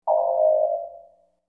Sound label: alarm, computer; romulan